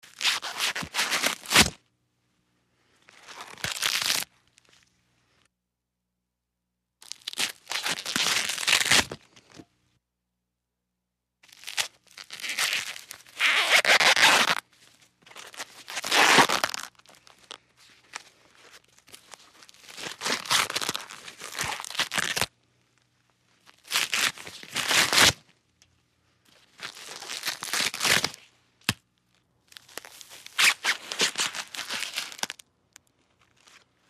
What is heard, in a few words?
Shucking Corn